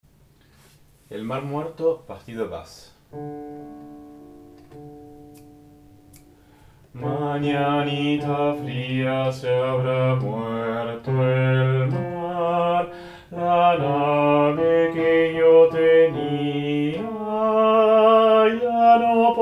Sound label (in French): Basse